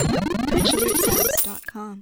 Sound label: Retro Donation